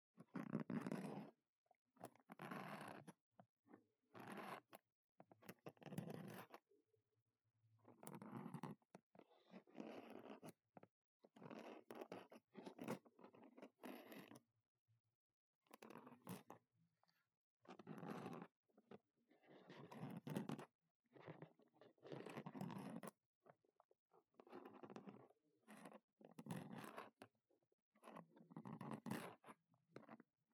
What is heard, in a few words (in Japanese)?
Mimikaki Left